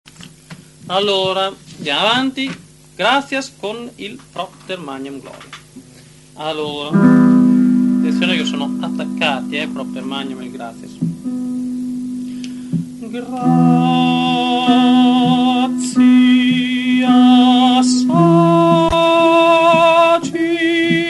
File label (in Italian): Gratias agimus Tibi Tenori; Vivaldi Gloria